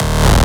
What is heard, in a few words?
Bass Shot D#